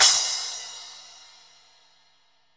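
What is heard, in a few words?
12 splash, Zildjian; Best Service; Real Mega Drums VOL-1; WHGM KIT 4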